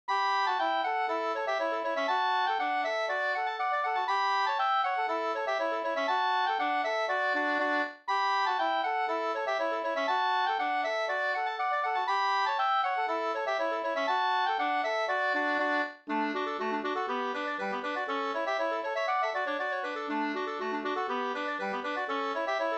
folkdans; Attamanengel 2st

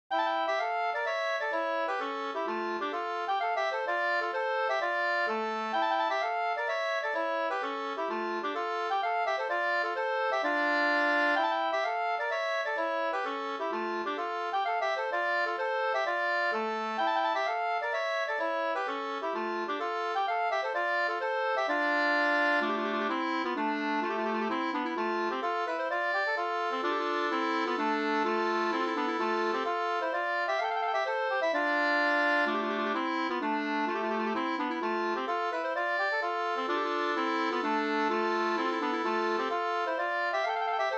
polska springlek

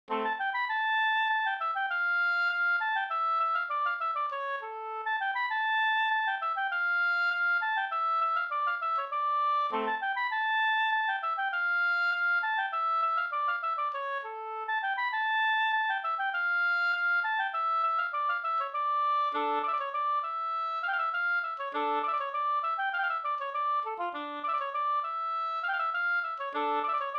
halling; fr Eksharad SvL Vr2

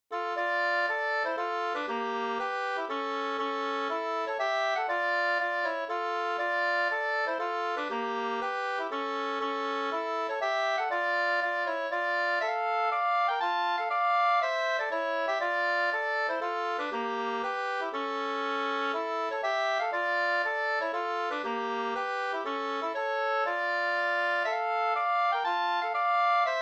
polska kringellek; Gagnef

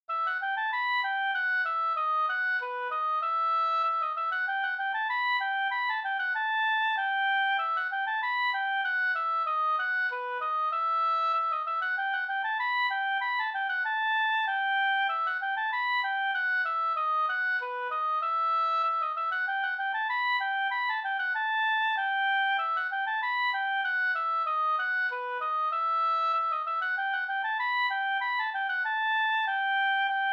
slängpolska

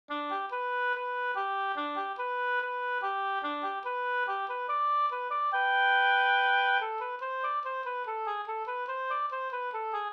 brudmarsch; e Skoglund e Jansas Arne Eriksson

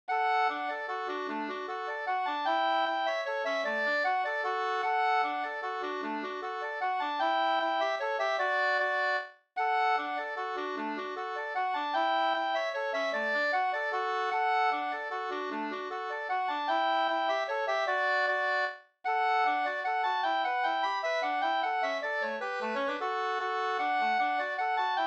mazurka, 2st

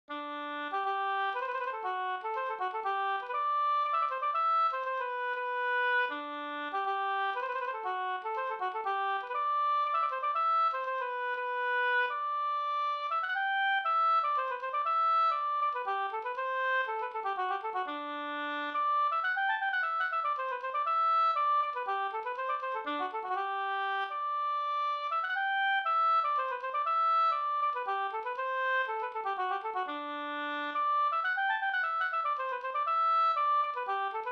polska Bingsjopolska; Martamesgubben e Pekkos Helmer

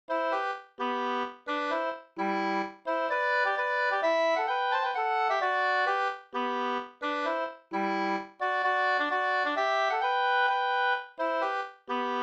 hambopolska

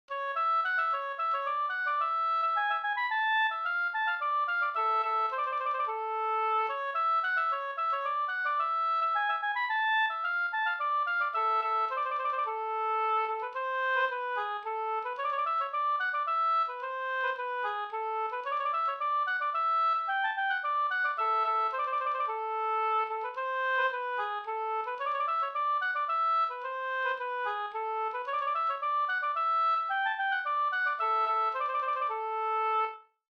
polska Bingsjopolska

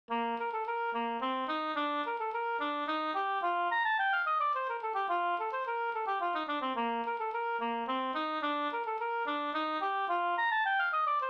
polska polonas Stugun; Orn

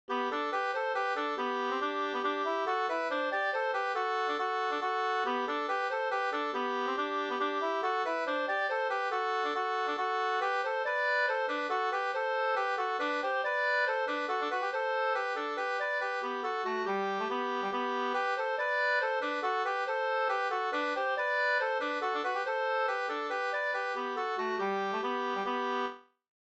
folkdans, 2st; jämför melodin till sångleken "Petter Magnus han har lovt oss"

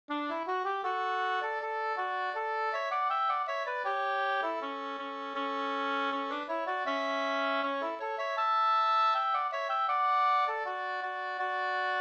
brudmarsch; Prastmarschen

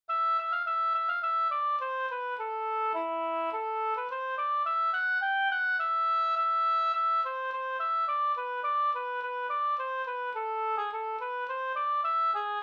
slangpolska

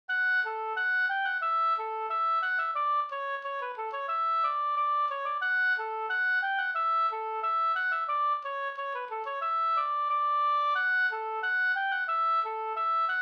ganglat

